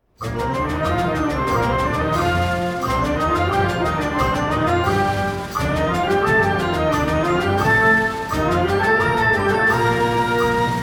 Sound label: Scale Pattern